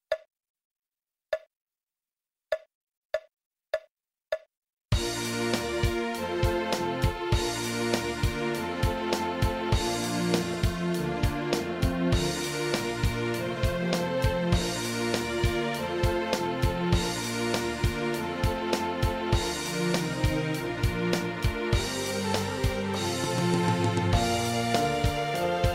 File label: Trumpet Tune